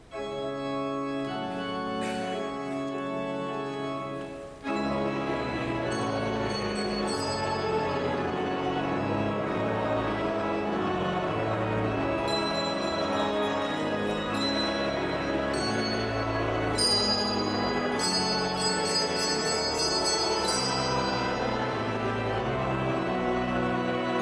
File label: Sanctus, Carillons